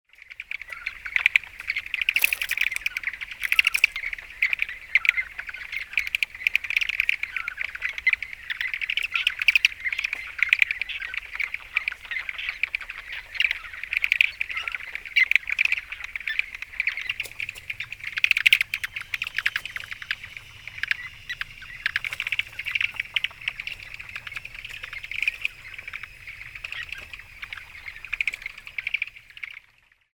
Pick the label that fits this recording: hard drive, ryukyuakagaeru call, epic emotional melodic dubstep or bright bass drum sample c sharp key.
ryukyuakagaeru call